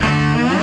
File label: orchestra string hit D